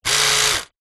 household razor electric remington male trimmer operate run dry short burst